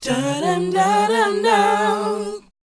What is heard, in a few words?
BOYZ D#, Vocal Planet R&B & Dance; ILIO